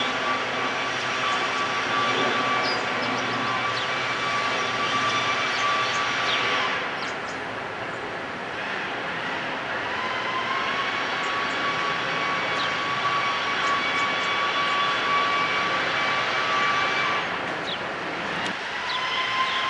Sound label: Hermit Thrush; at Fine Arts lunch